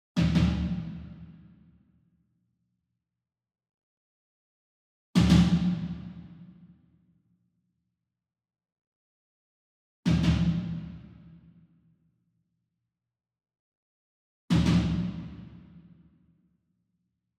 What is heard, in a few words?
SINGLE DRUM Tom Concert Double Hard Beater Far; boom library; Cinematic Strikes Construction Kit